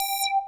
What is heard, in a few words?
PULSE BASS; Pro-Rec - Synthsonic; BASSES